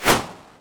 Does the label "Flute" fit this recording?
No